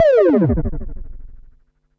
Perc Synth Moog Delay

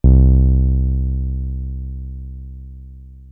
303 C2, TB 303, Synthesizer

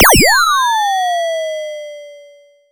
SYNTH FX

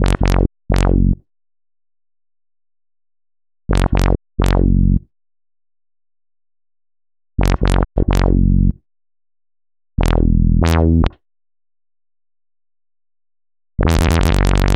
Wobble Bass; Sonic Foundry (Sony Creative Software); Afterhours EDM

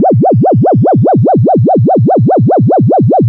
Noise, LFO & Noise

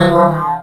VFX, VOX ROBOT; Best Service ProSamples vol.49 - FX-Area; AKAI

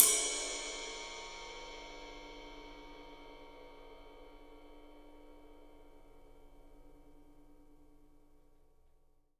ride cymbal; 3D Sound; 3D Drums

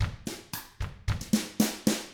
COUNTRY, Drums; ILIO; Double Platinum Drums 1

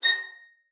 VIOLIN SPICC, A#6; Xsample VOL-5

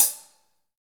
HH hh; Best Service Gigapack 2

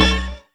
Vocal, Yamaha DTXpress

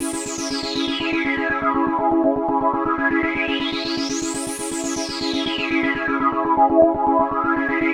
NRG Sweep, Trance; Sonic Foundry (Sony Creative Software); Trance NRG VOL-1; Sweeps

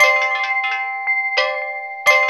CLOCK GONGS; Zero-G Ambient 1

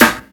SD HOU, Dance Mega Drums; Best Service

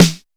626 snare; Drums In Your FACE!; NEWJACK

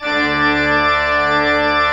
MANUAL D2, Pipe Organ; E-MU Producer Series Vol. 2 – More Studio Essentials; Keyboards

